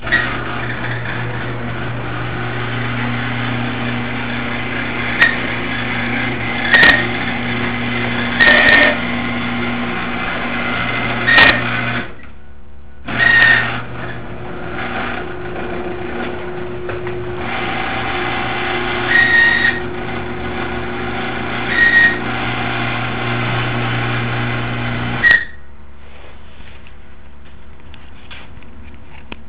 worn sears chamberlain liftmaster bushing, garage doors